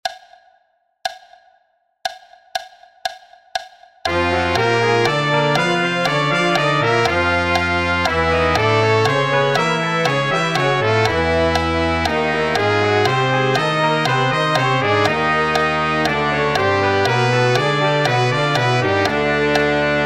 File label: 2 note lip flex